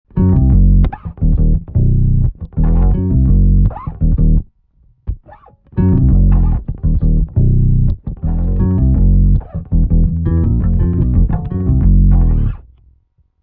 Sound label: Bass FX Loop MXR bass octave; Quad Cortex